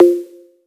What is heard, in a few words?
Woody g#2, drum machines